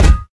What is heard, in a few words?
physics cube